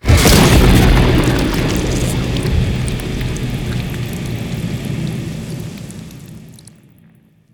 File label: activate; smite; chaac